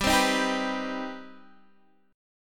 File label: C Minor Major 7th, G, chord